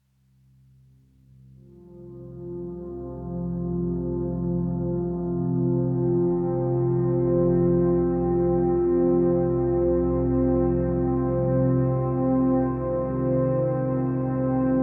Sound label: calm relaxing pad